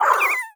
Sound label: vox hungry end, creatures, snark